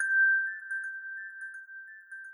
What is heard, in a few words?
mid chime